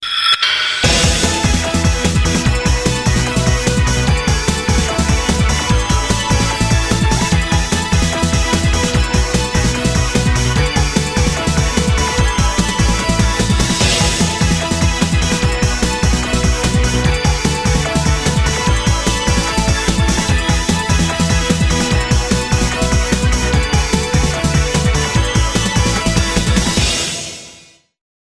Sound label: title, music; sven; sonic